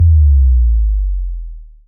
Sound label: brake fx